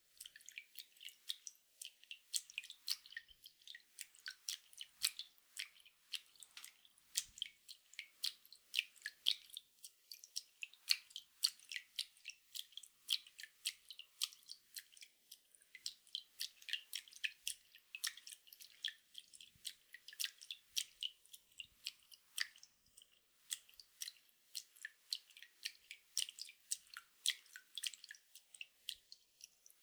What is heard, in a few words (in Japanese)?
チロチロ, 水音効果音